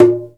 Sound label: PRC MED CG, Congas; Roland - Rhythm Section; Latin 1